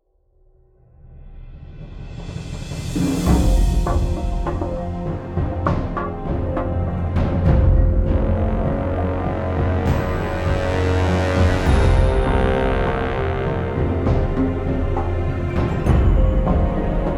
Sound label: Tense; Star Trek Resurgence